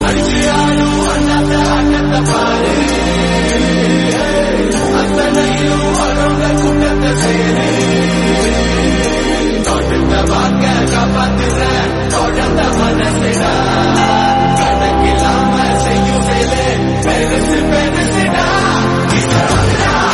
Tamil

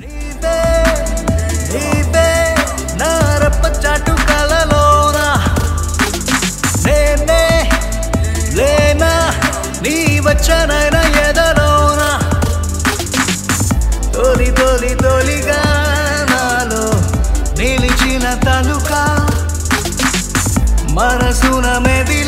Telugu

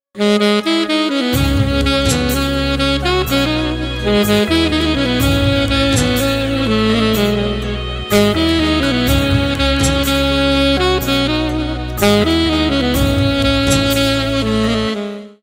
Instrumental Ringtone

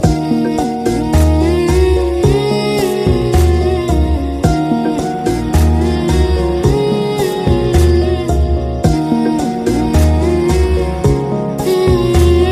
Instrumental Ringtone

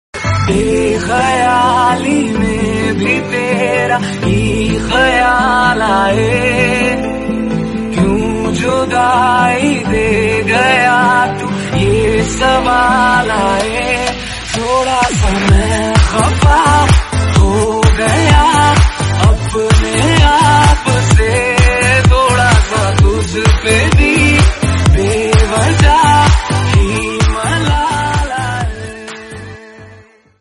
Dj Remix Ringtone; Kabir Singh

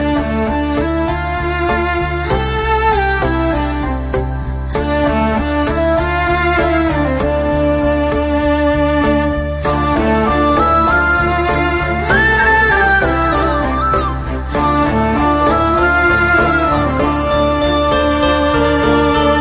Instrumental

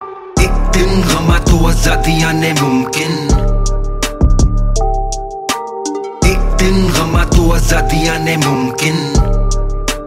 rap ringtone

